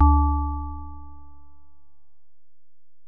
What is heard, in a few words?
Bell, Alesis DM-PRO